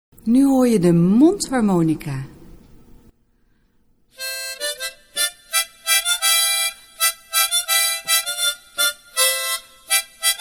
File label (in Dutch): mondharmonica